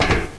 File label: metal